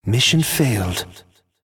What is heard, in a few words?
Die, cyborg; Rampant Cyborg; Mob Voice